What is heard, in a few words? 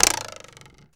RULER, RHYTHMIC HITS; Rarefaction; Poke in The Ear With A Sharp Stick